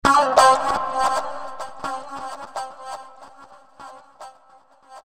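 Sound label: Musical Element, Drum Snare March; Blastwave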